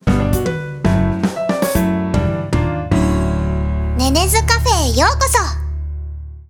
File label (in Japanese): ジングル